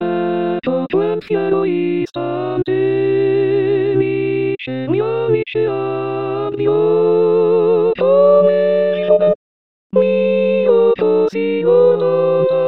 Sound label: tutti; Mozart; Ecco Quel Fiero Istante